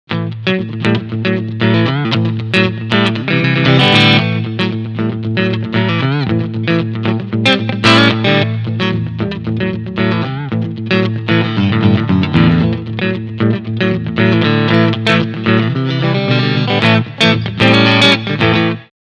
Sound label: Clean Front Singlecoil